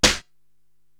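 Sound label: snare drum Snap Hop, Drum Machines, Korg TR-Rack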